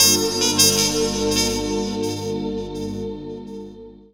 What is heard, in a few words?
Trumpet; Riffs, Arps & Hits; Jungle Jungle